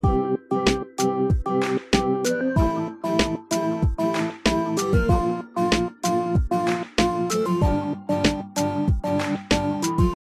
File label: minimo perc